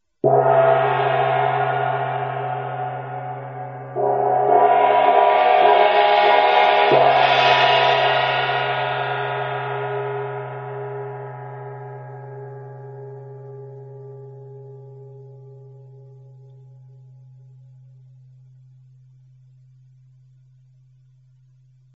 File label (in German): tamtam